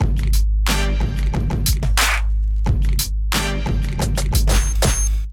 Kit Melodic 90 bpm, Drums; MIDI Clips; Full Lotus; Ableton; Chop and Swing

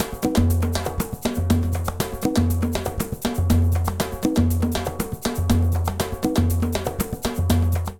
Candombe B-120bpm, Latin Percussion; Ableton; Factory Packs

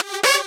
Synth; Launchpad Mini MK2; Lightshow Pack Project

